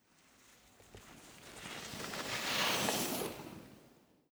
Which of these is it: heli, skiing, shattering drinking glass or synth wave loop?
skiing